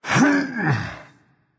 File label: grunt lift berserker; cuchulainn